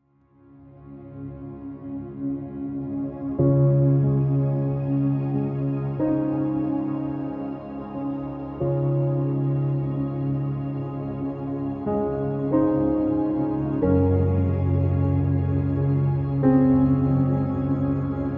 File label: Spa Music